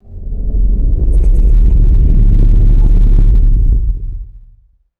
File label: deep low frequency electrical hum swell; electricity